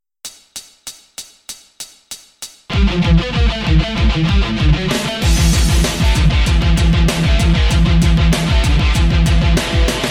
thrash lead

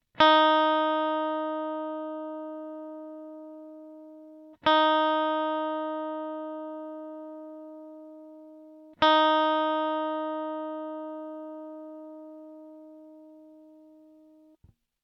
open c m e flat

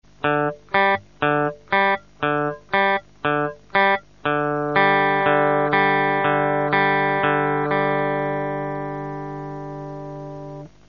ear tune

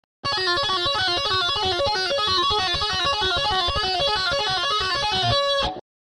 tapping

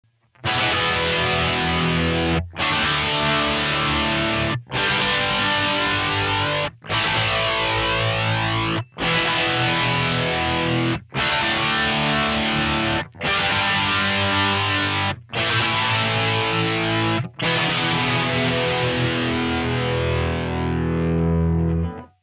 electric guitar

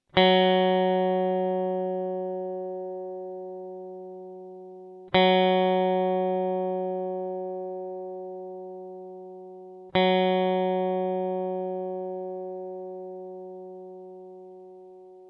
open D F sharp

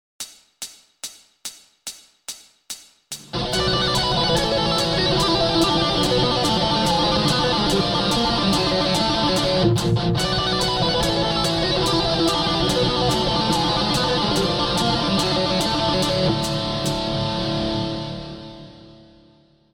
tapping

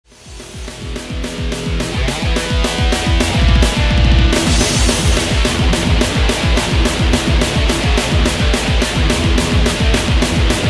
thrash

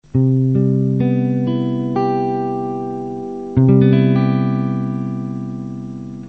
cmaj7 barre

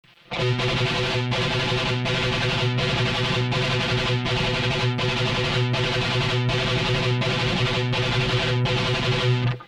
thrash

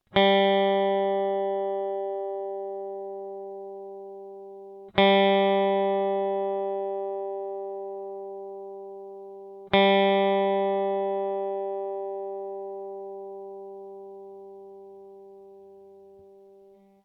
open g

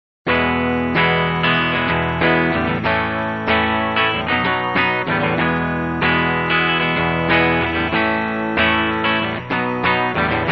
i iv v prog

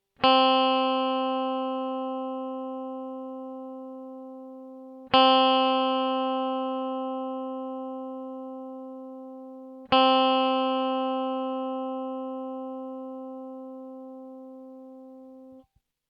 high c

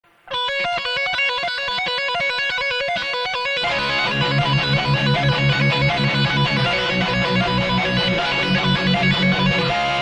tap 5 c

